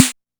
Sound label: KORG 01W KIT; Rubber Chicken Software Sample Bank